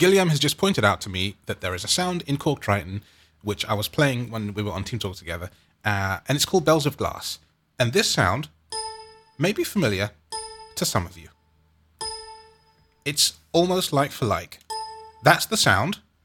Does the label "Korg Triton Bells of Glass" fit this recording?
Yes